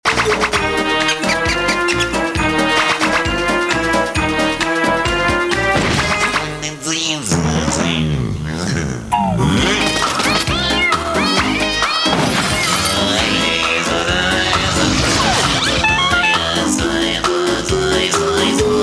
Samsung galaxy nexus, nhạc chuông

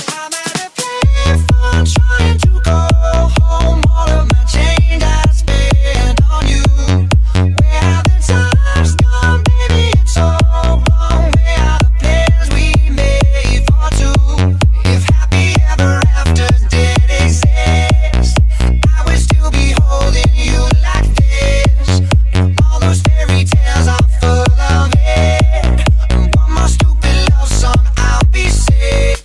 Nhac Chuong Remix

